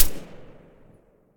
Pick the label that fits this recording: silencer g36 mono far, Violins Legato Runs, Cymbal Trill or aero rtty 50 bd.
silencer g36 mono far